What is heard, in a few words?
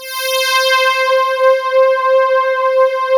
PULSATN, PULSE; PULSENSE